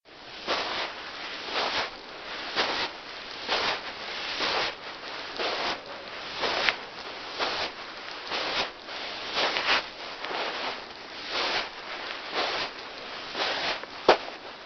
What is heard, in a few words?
sand steps